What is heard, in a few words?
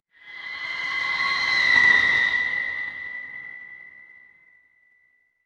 horror stinger